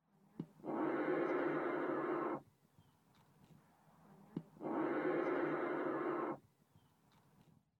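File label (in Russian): дрофа токующий самец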